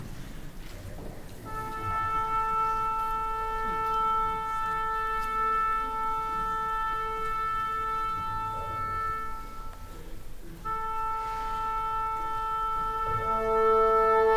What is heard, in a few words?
tuning up